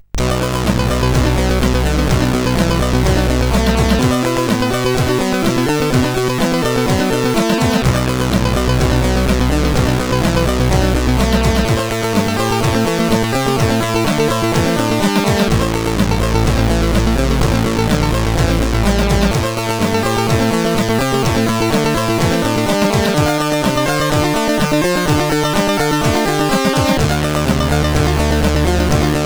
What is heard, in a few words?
sid CSG8580R5